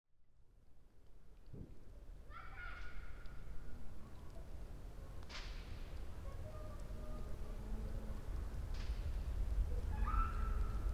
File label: child raindrops campfire firework traffic; ORTF